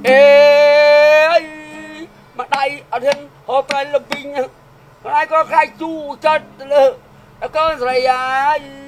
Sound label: voice, cambodia